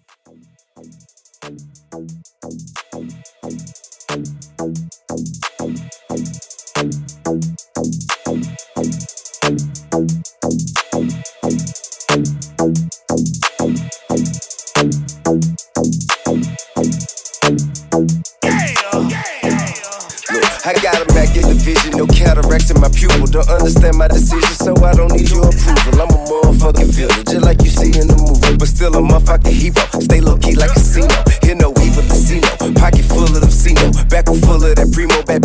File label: Clapapella in HipHop Dirty 90 Bpm